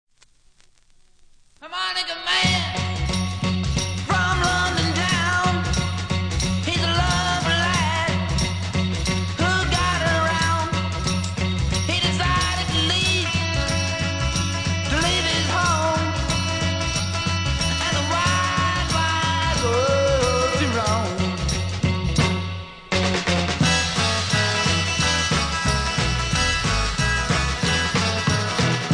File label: harmonica man